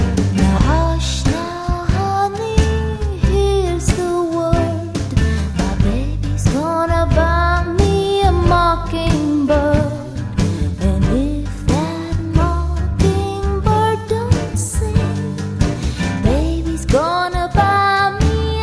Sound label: Mockingbird Song, harmonica